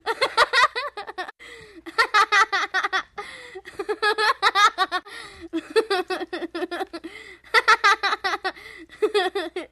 Child Laugh girl Evil

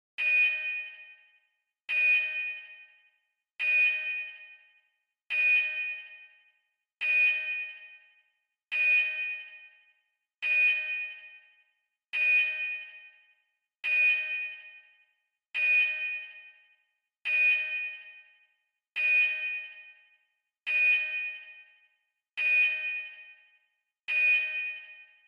Sonar Space Beep; beeps

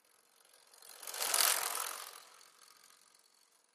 Bicycle Ten Speed Bic

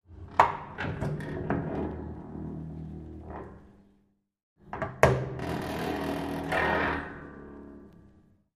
Elevator Door Metal, hinges creaks; Elevator Door, Metal, Creak x2

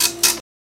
Printer Sound Short

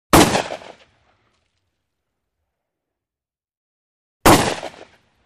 12 Gauge Shotgun Sing, shots

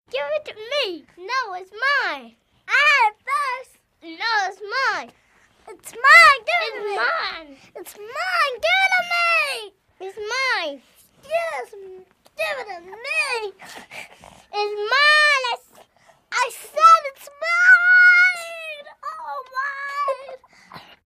2 Kids Fighting

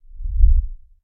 Subwoofer Sngl Whoosh

